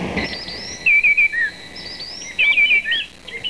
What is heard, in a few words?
woodwren